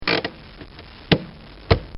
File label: Pull And Release Parking Brake, Car; Vehicles; Cars; Bobby Cole